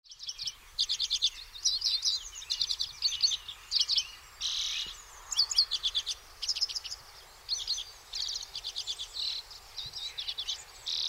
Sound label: lesser redpoll